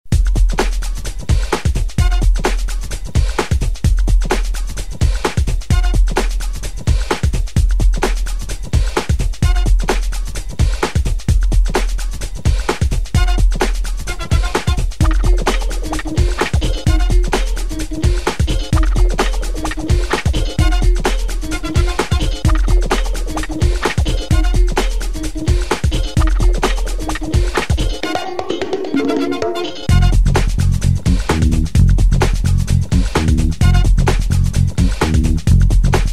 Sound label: Upbeat